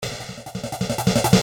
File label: Drums Roll; D n B; Drum Patterns